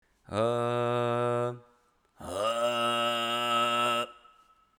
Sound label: Normal khorekteer